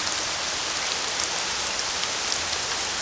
rain town; Mafie 1

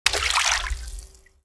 water; Mafie 1

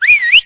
zip; Mafie 1

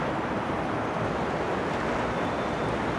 town door; Mafie 1

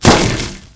bush; Mafie 1

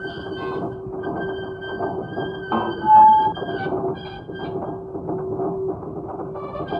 chamber in; Mafie 1

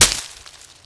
bush; Mafie 1

